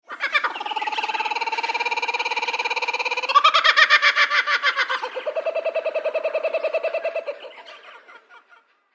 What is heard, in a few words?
kookaburra sound effect; myro the musical